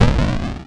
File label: rumble; cavernsoffire